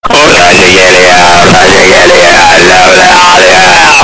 earrape

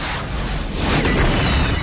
missile attack

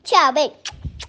smack smack

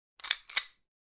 hand gun

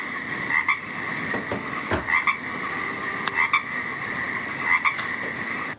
ribbit

